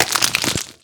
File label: Crack Shatter